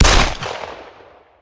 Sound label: Gun, Hand, 9mm, Single Shot; gun and weapon sounds; captain audio; fully loaded